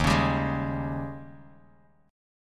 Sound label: D sharp 11th D sharp, Bass Standard; strummed; 11,16,17,13; JGuitar